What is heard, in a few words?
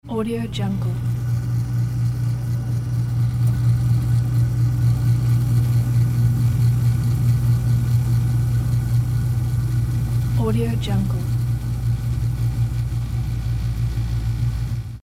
machinery